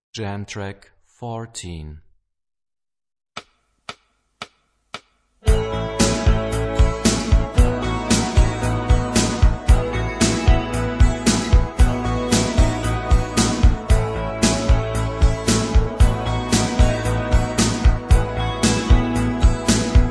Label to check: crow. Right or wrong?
Wrong